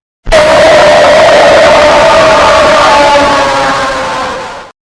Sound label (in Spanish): sonido de screamer fuerte